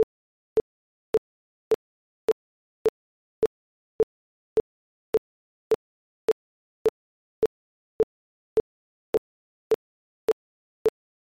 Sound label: metronome